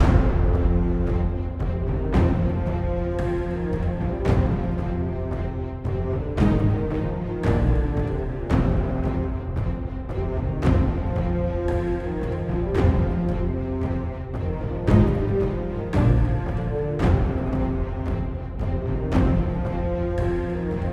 dungeon theme loop; TinyKeep

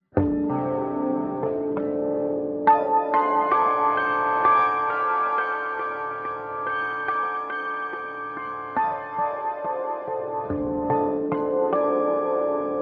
G steel

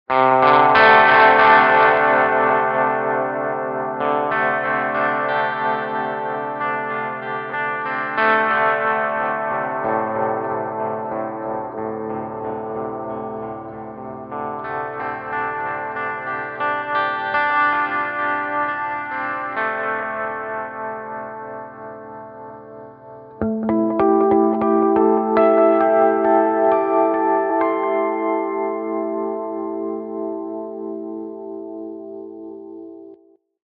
G steel

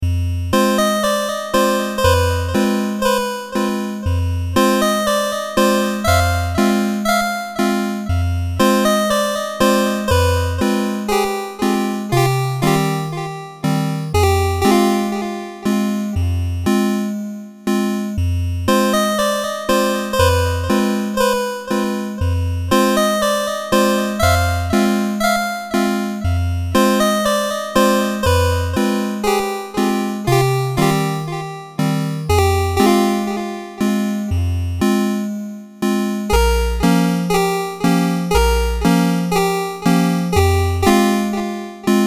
gameover; Buff Knight

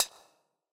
Mouseclick

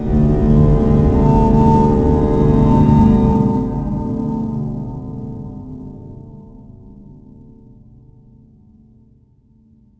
church open grave sound; Haunted

